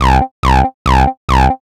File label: NRG Lead, trance